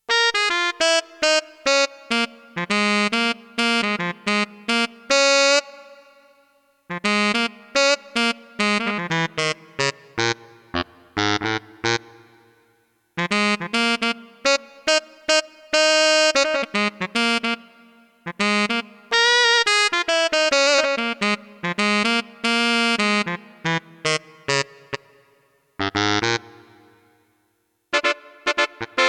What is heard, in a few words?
SAX, Roland JX-1; Factory presets